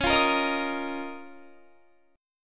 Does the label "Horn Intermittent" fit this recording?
No